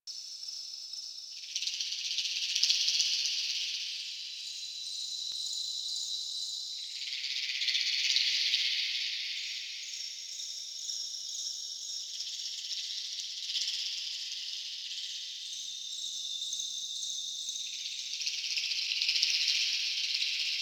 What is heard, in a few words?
Interior Caves Bog Loop, Ambience; Warframe; Infested Eris